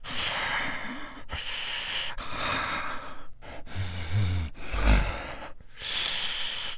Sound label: breath light